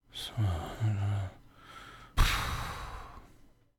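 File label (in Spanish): BALBUCEO RESOPLIDO, babbling snort; yesterday origins gamerip 2016 switch ps4 pc ios macos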